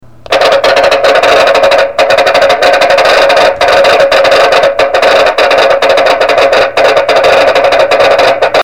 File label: telegraph